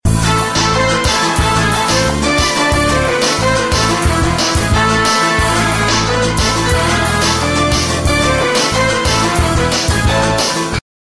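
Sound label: Arabic Ringtone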